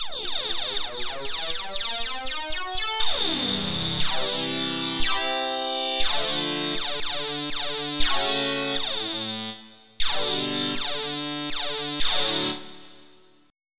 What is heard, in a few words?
ARP Saw Wah Slow